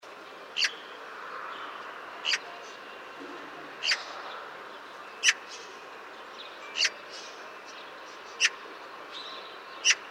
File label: Saksagan Eurasian Magpie Pica pica